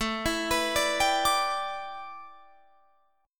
A 9th Suspended 4th Guitar Drop D, chord

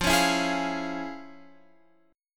F Minor Major 7th Sharp 5th Guitar Open F; strummed; JGuitar

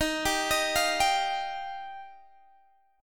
Eb Major Add 9th, Guitar Open G

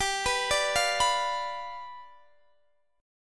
G 7th G down Guitar Open D

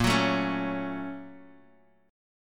A sharp Minor Major 7th Sharp 5th, down; A#mM7#5 strummed; JGuitar

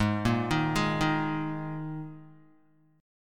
G sharp Suspended 2nd Guitar chord, down; G#sus2 arpeggiated

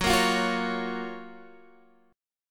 F sharp Major 7th, Guitar, DADGAD

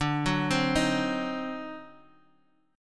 D 7th Flat 9th, Guitar Standard; 10,9,10,8,x,x; arpeggiated; JGuitar

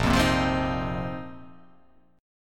A sharp Suspended 2nd Suspended 4th down

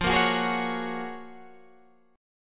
F sharp Major 9th

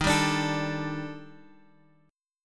D sharp Major Sharp 11th D sharp, chord; 8,7,10,12; 4G,5C,5E,5A; chordshape

